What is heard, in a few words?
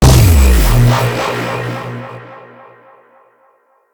UI Crafting Finish